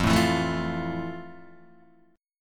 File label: F Major 7th F, Guitar, DADGAD